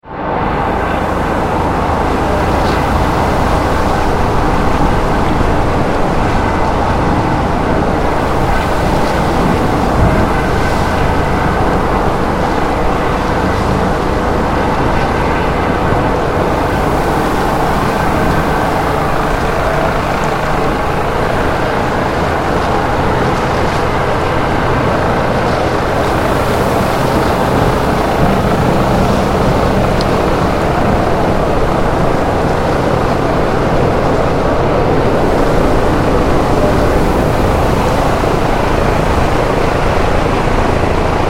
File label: Air Sea Rescue, Dramatic air sea helicopter rescue in a storm.; Helicopters; Vehicles